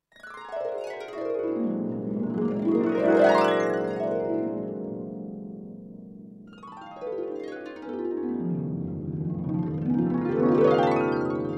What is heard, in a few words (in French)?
Harpe